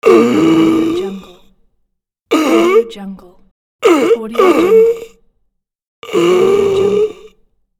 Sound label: male last dying breath